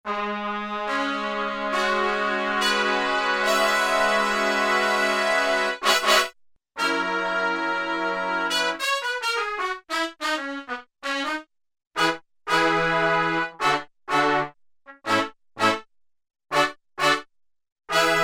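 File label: Brass Trumpets Velo Xfd; Brass And Woodwinds; Roland L-CDX-03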